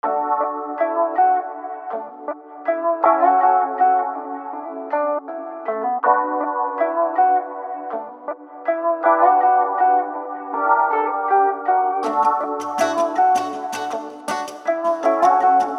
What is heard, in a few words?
Lofi attempt